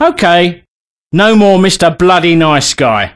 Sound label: cheer